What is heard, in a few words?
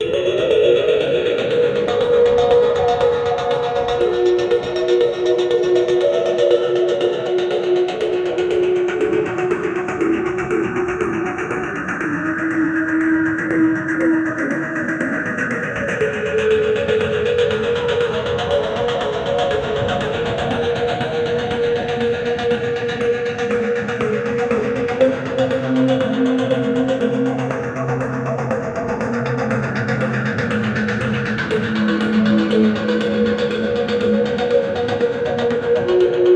dark unsettling, psychological